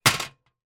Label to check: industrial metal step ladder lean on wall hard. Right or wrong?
Right